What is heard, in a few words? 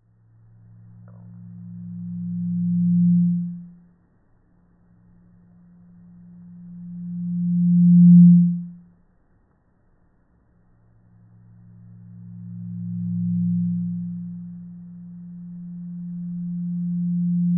Feedback